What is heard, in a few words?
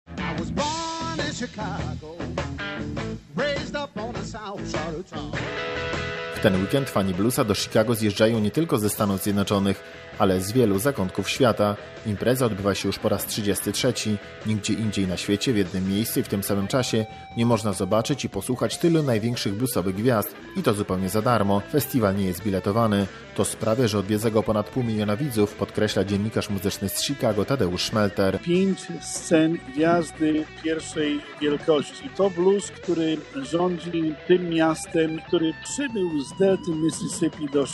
blues